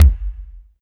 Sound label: Kick, Alesis DM5